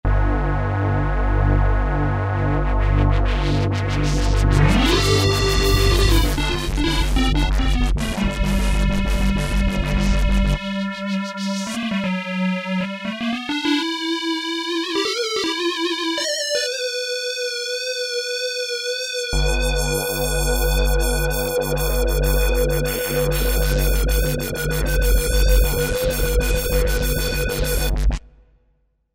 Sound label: Old & Analog, Korg TR-Rack